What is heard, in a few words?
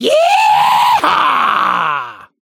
Grinding Pipes